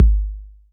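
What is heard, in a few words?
bass drum 808 Mid Color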